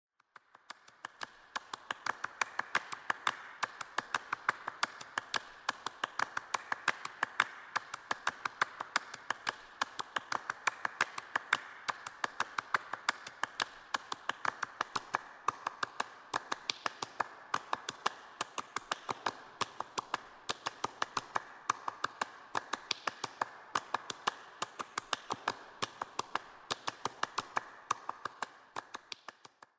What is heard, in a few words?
clapping music